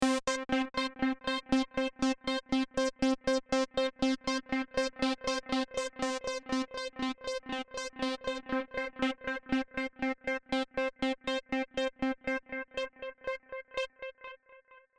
Panning Around

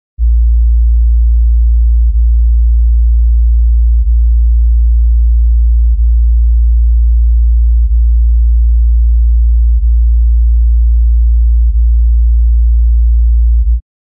Expensive Drums

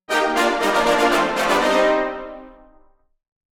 C minor

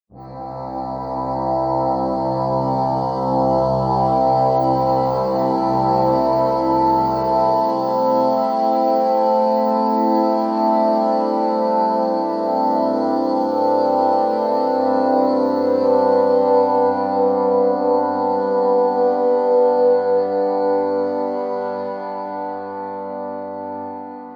Horn Warped

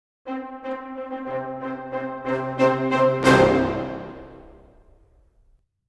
tutti C

